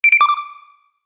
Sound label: Science fiction Activate Sound